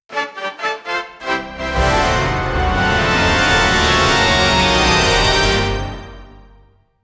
maximum energy jackpot, circus

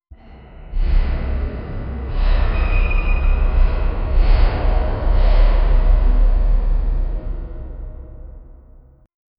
dark corridor ambience, metallic scraping

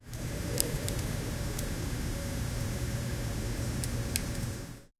faint paper rustling in a

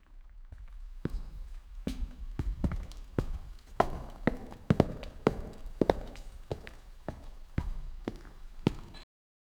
deep sound of heavy boots, cave

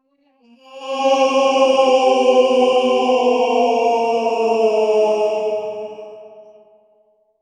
prehistoric human sound

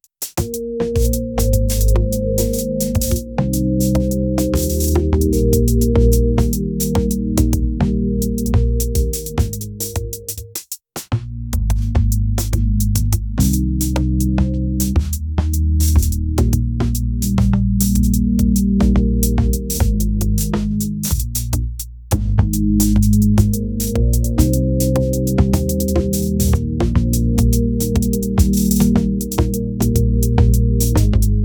deep funky bass, funk; 47 second

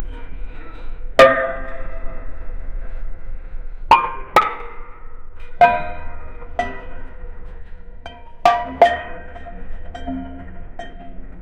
first many ancient clay

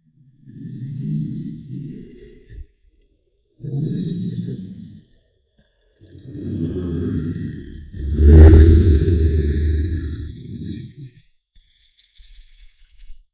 group of big snakes comin, reptiles

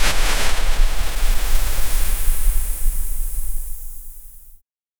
desert sandstorm

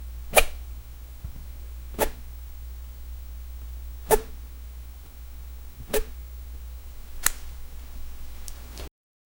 puppet grabbing the strin

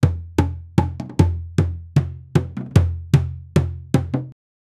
the sound of drums in, africa

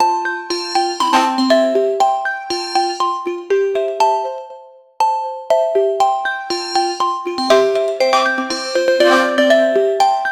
news jingle

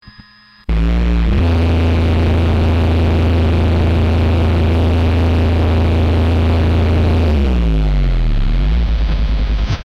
a xbox original cpu overh, explode

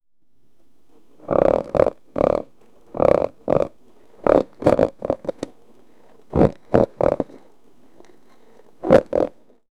wood carving sound; woodworking

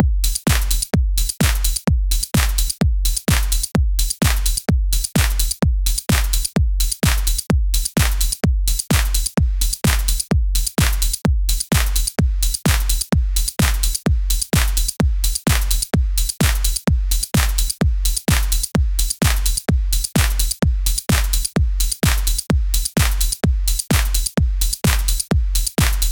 progressive house; high quality mastered 128

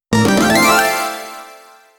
short digital sound logo

